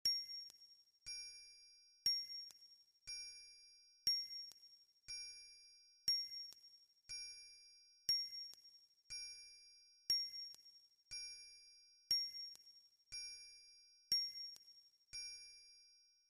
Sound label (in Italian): Triangolo